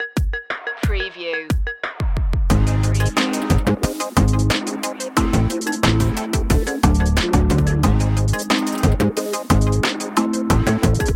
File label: freaky game, chip tune, Drums and Bass